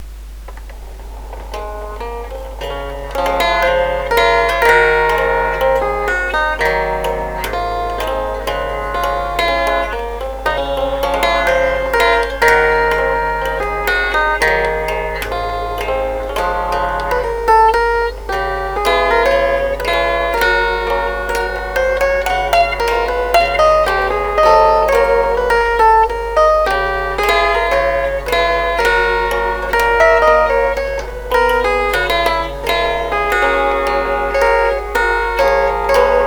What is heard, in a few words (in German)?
Chordephon